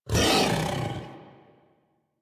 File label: Feral Vocal Angry Attack Charge; Warframe; Kubrow